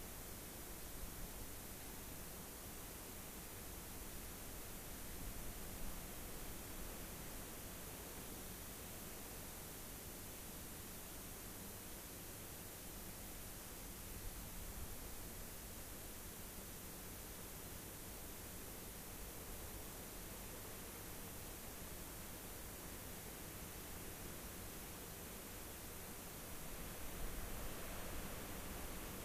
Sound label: rayol farm; SEAMED